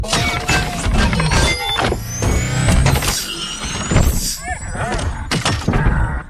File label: bumblebee s transformation